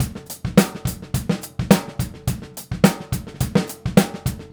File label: Straight Kit, World Pop Beats